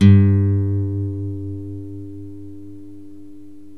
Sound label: NYLON GUITAR